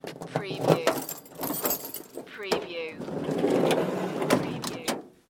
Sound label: Van side door opening with key noise; beanstalk audio